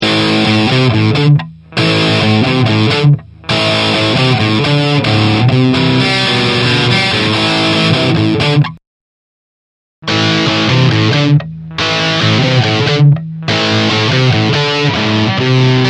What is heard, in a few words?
Mic 57 vs Cab Rock